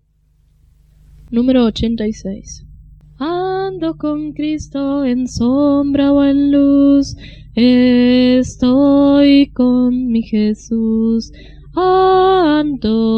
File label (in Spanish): Coro, himnario niños